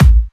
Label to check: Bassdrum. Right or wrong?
Right